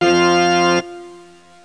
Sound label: Organ F; RenderOrgan